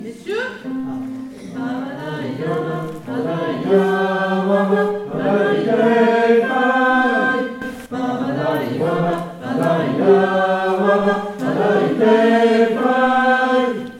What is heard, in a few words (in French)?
Pabada messieurs, chorale